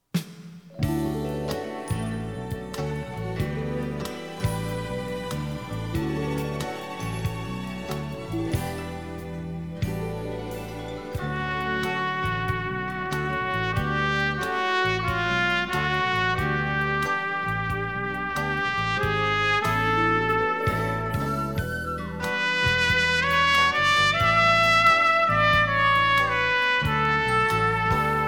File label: Suite For Piccolo Trumpet